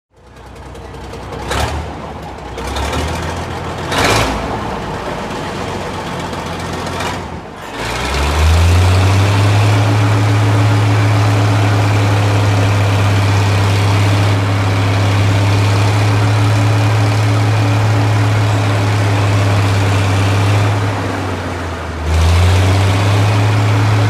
Crane; Rev, Idle; Crane; Idle / Revs, Metallic Squeaks, Medium Perspective.